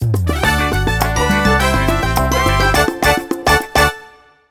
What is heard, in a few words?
Jingle World Clear; Pig Medal; Wii U